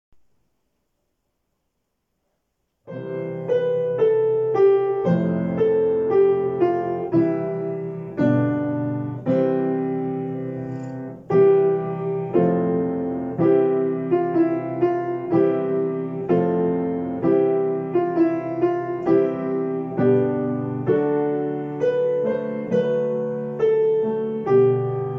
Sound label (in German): Klavier; O du fröhliche, o du seelige Weihnachtszeit